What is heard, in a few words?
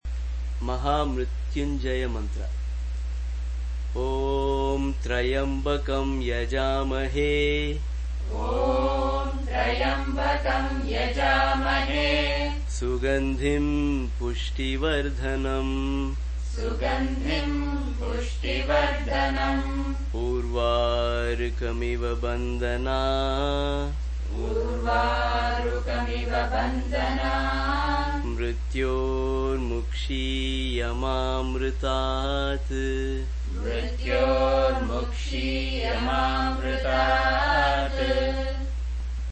maha mrtyunjaya mantram